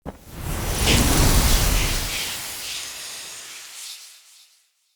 Soul Steal Burst

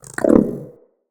Wyrm Idle, Pets, Vocals

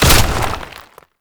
Concrete Archgun Charge Full; Warframe; Archwing

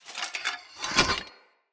Two Handed Mace Equip B; Weapons; Enemy; Blunt; Sinecure Two Handed Mace

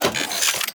Ship Turret Closet Open A; Gameplay; Ambience; Warframe; Corpus Ship Remaster

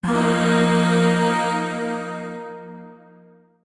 Shawzin Pent Min Chord C; Warframe; Zariman; Void Shawzin; Chords

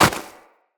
Operator Walk Snow, Footsteps

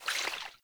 Alchemist Vial Foley; Warframe; Warframes

